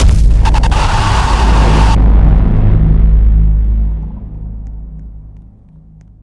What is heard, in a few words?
howl